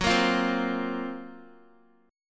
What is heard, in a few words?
F sharp Major 7th Flat 5th, Guitar; strummed; JGuitar